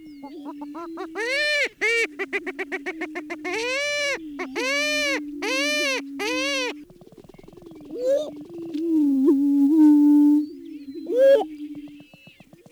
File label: Heath Hen